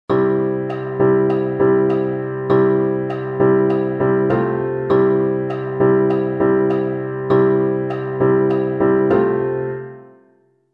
harmonischer Akzent; Songwriting Grundlagen

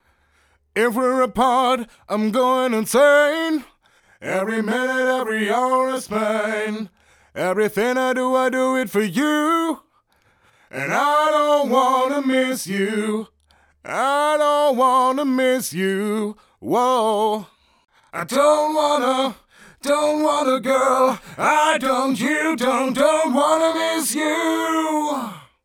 Modulationseffekte zur Stereoverbreiterung Haaseffekt

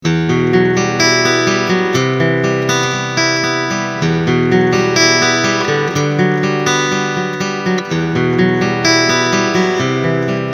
pickinglinie mikro; dimavery stw-60

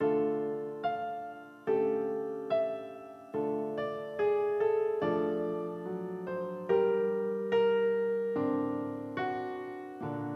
klang; classic cantabile dp50

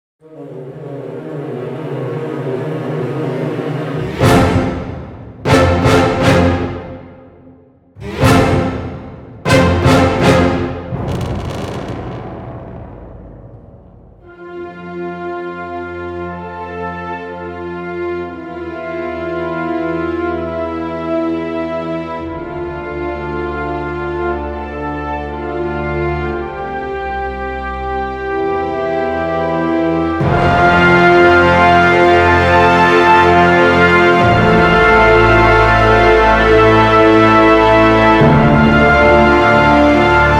orchestral essentials full, virtuelles Orchester; ProjectSAM

